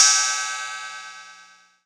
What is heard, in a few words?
long room reverb crash cymbal sound d key; drum sample